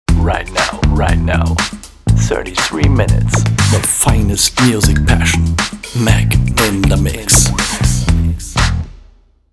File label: Jingle